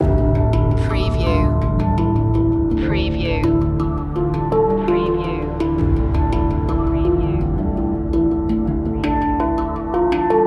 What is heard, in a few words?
modern no electronic drums